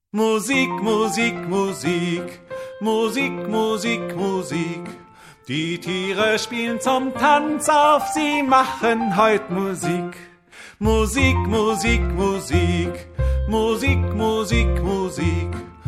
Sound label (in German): musik musik musik